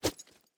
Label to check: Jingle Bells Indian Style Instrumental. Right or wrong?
Wrong